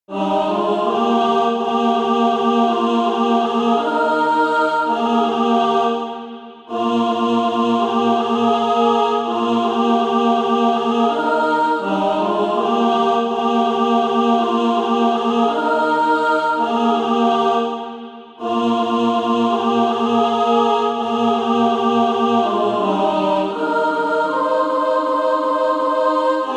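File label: alto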